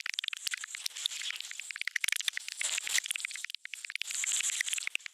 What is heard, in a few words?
Wood Wasp Sing